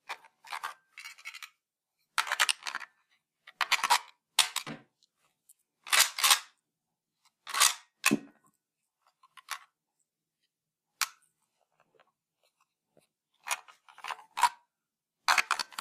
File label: Gun Load